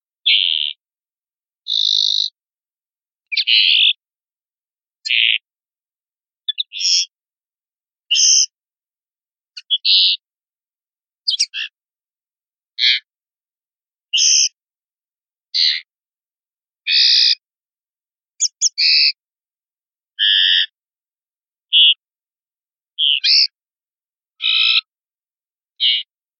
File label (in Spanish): ranadas y ronqueos, pardillo